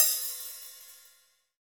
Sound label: HH, Real